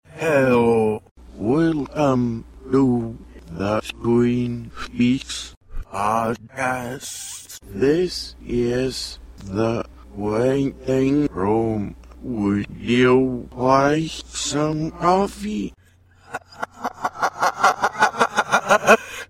PodCast Open

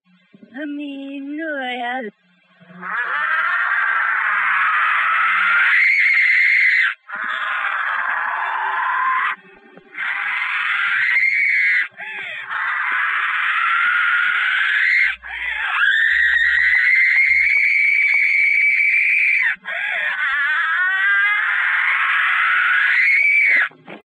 Scream; Twin Peaks

